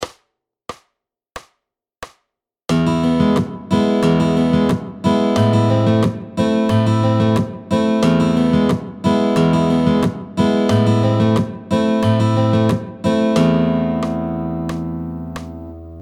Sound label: Percussive Fingerstyle, Guitar